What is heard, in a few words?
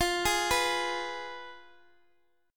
F Diminished Guitar Half Step Down